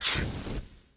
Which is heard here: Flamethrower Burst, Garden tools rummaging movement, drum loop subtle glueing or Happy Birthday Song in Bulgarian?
Flamethrower Burst